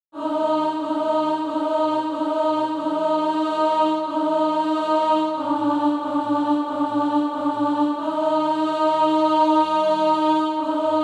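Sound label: alto, Practise Music; Cross of Christ Lead Onward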